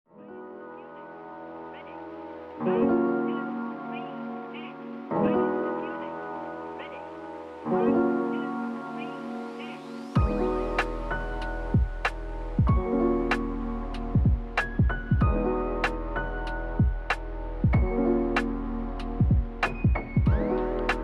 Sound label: Soft Rain Serenade, Lo-fi